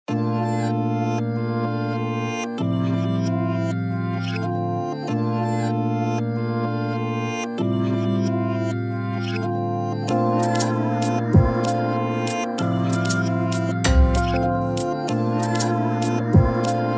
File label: Afro chill, Type Beat Instrumental